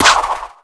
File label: jump; sonicnew